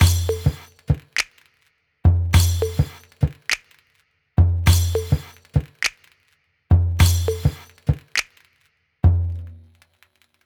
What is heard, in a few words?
perc loop tom tom